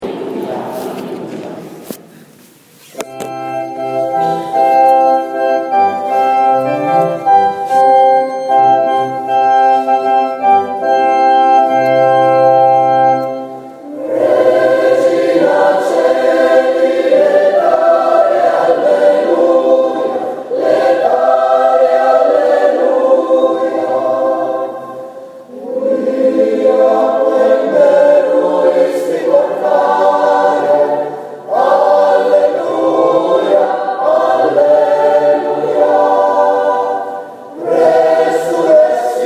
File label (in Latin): regina caeli